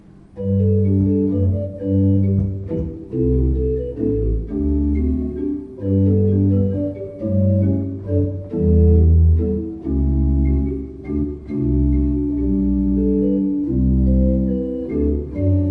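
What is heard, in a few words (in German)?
Bosch Raupach 5 I P, pfeifenorgeln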